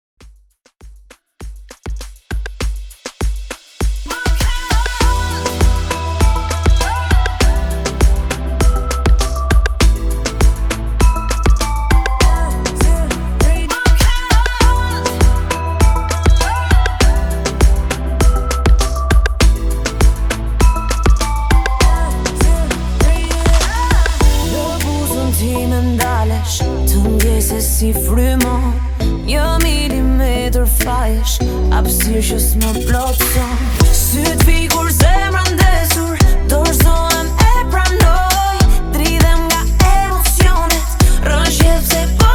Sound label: REDRUM, 100 BPM